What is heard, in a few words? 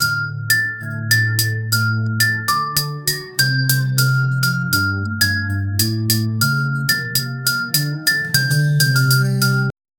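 casual vibe for a supermarket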